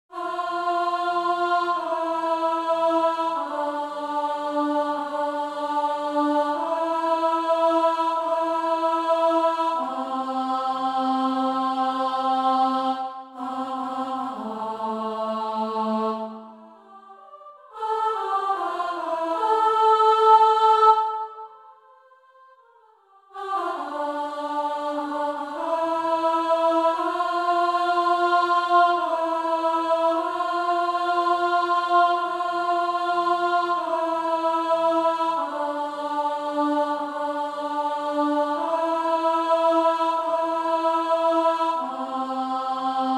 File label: Alto